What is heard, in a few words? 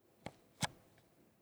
Start, Game Boy Advance SP; Mod AGS-001